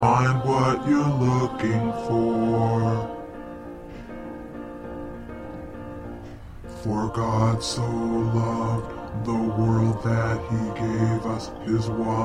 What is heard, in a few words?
Bass